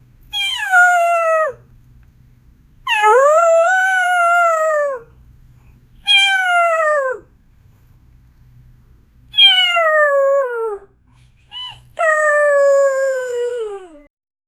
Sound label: fox sound voice, call